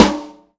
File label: Snare Alt Rim Shot